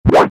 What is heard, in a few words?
Boss Weasel Jump